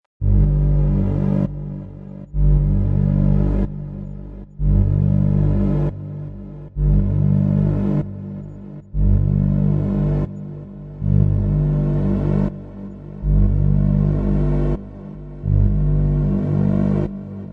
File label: cinematic impact climax intro